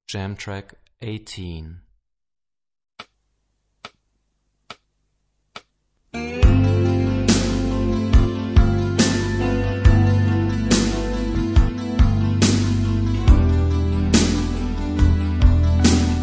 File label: Rock guitar; Rock gt Secrets